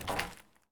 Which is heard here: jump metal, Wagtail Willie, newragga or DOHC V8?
jump metal